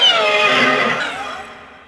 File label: Shiny sound